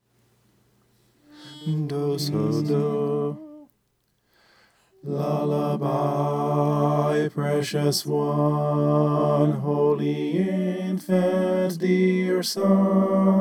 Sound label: bass